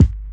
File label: classic hh Pel kick2, Classic Hip Hop; STYLE KITS (Hip Hop, Etc); Classic Hip Hop Mega Kit